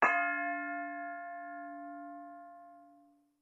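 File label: Boxing Bell Single Ring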